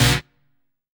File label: HOUSE, House Dance